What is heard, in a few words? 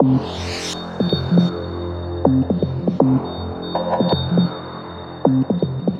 80 Fm, Melodic Loops; M8; Electronisounds